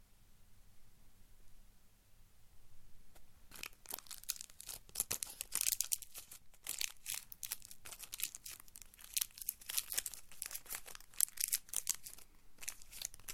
sweet wrapper crumple, Environment - Bedroom, a lot of absorption, bed linen, carpet.